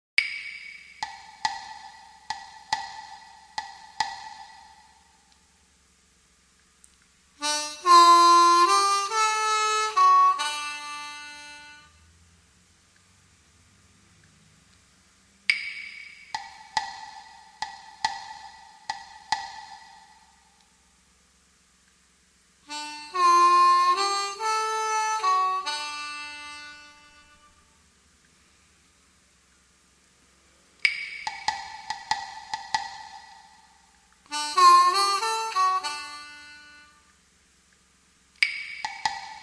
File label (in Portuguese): gaita blues lick; gaita diatônica